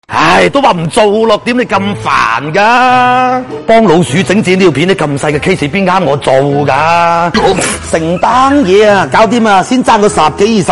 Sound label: laugh; breadradio